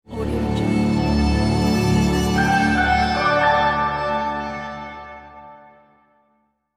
uplifting ambient logo jingle

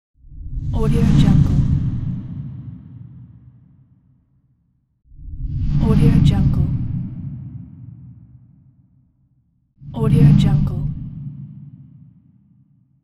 woosh transition

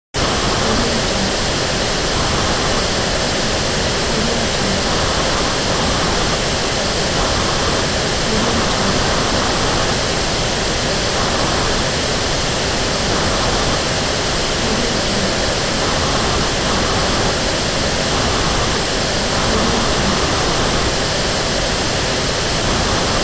tv no signal white noise

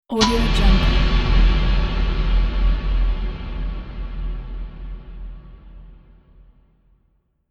stadium light

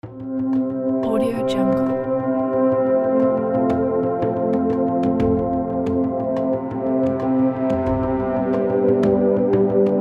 ethnic inspirational; tastyaudio

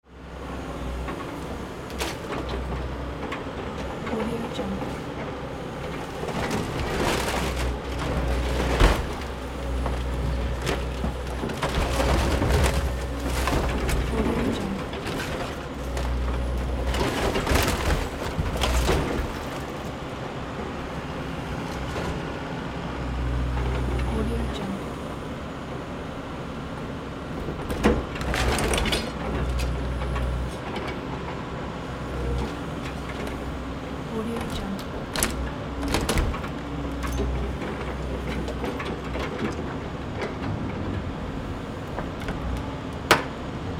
junkyard ambience dismantling a car

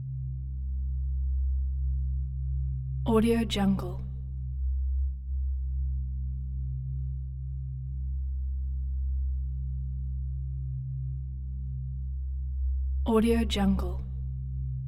ship set drone loop; soundmorph

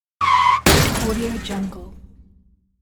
car crash

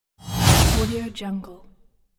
the whoosh

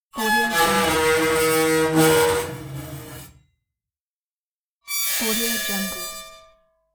metal screech

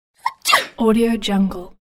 cartoon sneeze

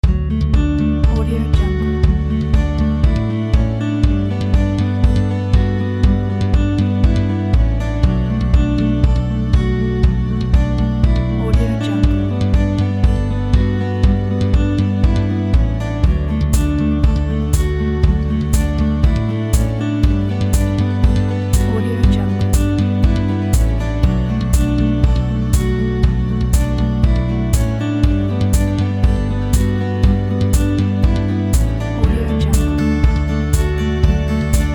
happy

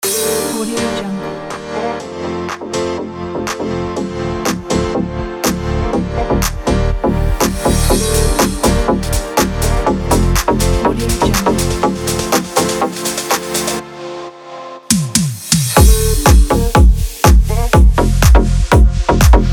music

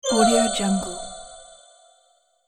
fairy warning

